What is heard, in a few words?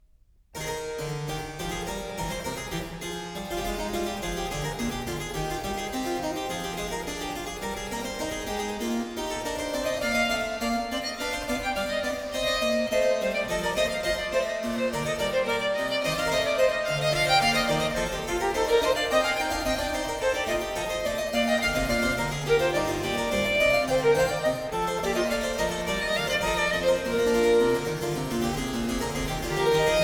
Sonata No. 3 in E Major, Allegro, Violin and Harpsichord; BWV 1016; Bach; J.S. Bach